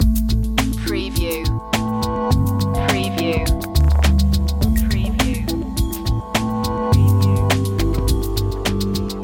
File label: loop, chill